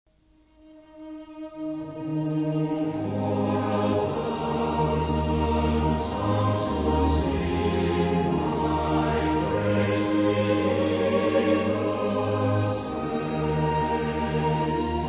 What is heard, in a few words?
Orchestra and Choir, Hymns